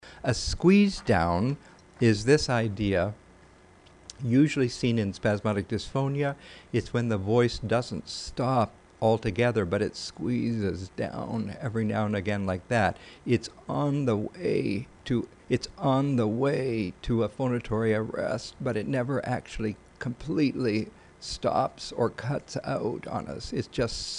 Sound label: Squeezedown